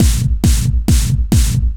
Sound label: Kick Snare, EDM; Sonic Foundry (Sony Creative Software); Afterhours EDM; Session 10 - Come In Hard